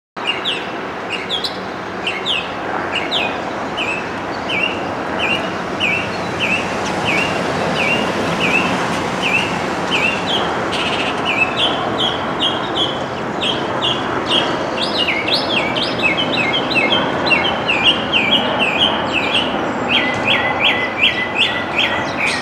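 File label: Mockingbird Songs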